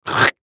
Human Throat Clear, Throat Clear Hock Element Male Adult; Male Middle Age; Clearing Throat; Blastwave; Humans